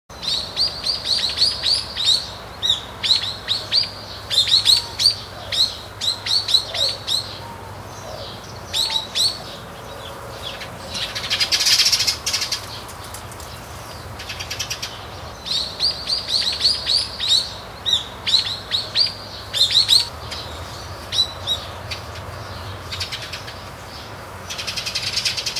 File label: hiyodori, bird; azamidani